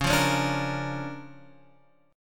D Minor Major 7th Sharp 5th, C sharp

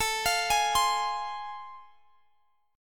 A Minor Major 7th Sharp 5th A, Guitar, Standard; AmM7#5; arpeggiated; x,x,19,22,21,20; down; capo=9

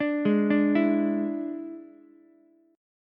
D Suspended 2nd Flat 5th down Ukulele; arpeggiated

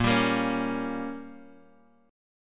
A sharp Minor 6th A sharp, Guitar Open G

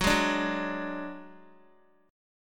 F sharp Suspended 2nd Sharp 5th, Guitar; strummed; JGuitar

